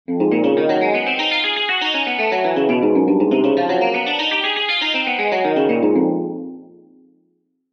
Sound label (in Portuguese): Exercícios Guitarra 120 bpm